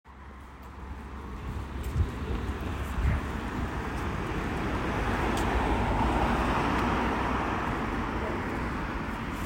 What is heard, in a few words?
East Meadow Park